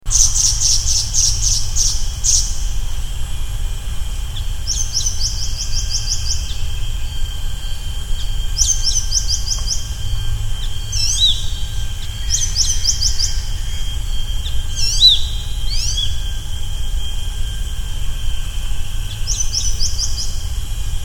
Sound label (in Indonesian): suara ciri siri